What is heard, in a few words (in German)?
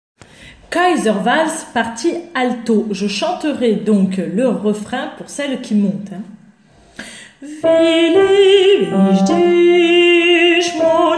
Walzer alto; Kaiser; Strauss